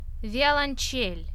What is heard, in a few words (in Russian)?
violonchel